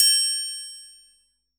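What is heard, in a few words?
Open TRIANGLE; JV-1080; EdgeSounds; Drum Mashines; JV-1080 DRMS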